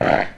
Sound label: leap begin, banshee